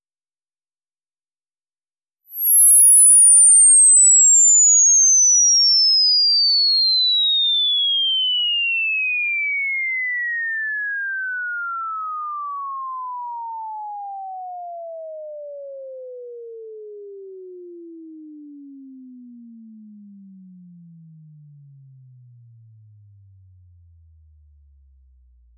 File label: Inverse Sweep 20 to 20000; holy trinity church; 30s ESS